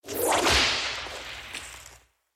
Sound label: whip